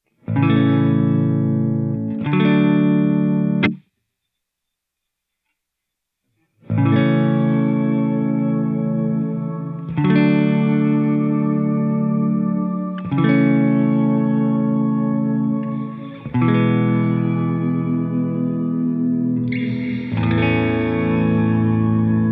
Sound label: Ambient Swell Baritone Chord, reverb